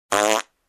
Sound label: Human Fart